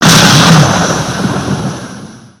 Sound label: flashbang; minecraft